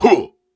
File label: Grunts and Chants, Viking Vocal